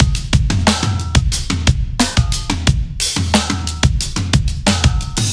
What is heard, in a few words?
drum loop tom beat 2bar 90